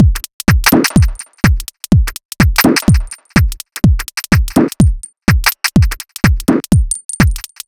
Delays 125bpm, House Drumloops; Zero-G House Fabrik; 90 s Sample CDs

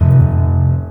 THUD, The Piano; InVision Interactive

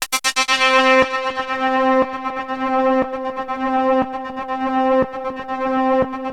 Access Virus B; Synthworx

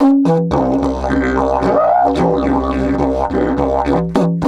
108, DIDGE 108BPM

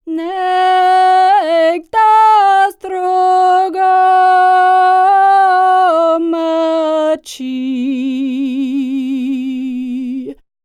SERBIA, Vocal World Voices SERBIAN; ILIO; Vocal Planet VOL-5

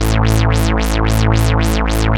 Mini LFO; SFX & One-Shots

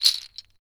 HUSK SHA, CAMEROON; AKAI S6000 CD-ROM - WorldSounds; CAMEROON PRC

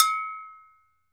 TOKE BEL, Drums&Percussion, Toke Bell; E-MU Producer Series Vol. 7 – Old World Instruments

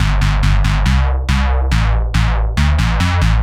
140bpm, future rave samples

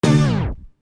Stab; Sonic Foundry (Sony Creative Software); Infinite Soul VOL-1

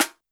Snare Break, YAMAHA RM1X

